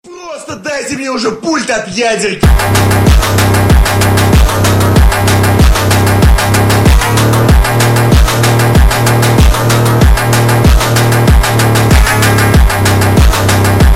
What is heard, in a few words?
phonk